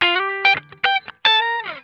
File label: GLIDE, Funk Guitar; Zero G - Funk Guitar